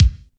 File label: crispy kick sample f key, Loudest frequency: 728Hz; kick drum samples